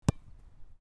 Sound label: kick